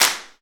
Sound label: sharp hand clap sample b key, Royality free clap tuned to the B note. Loudest frequency: 5171Hz; single hits one shots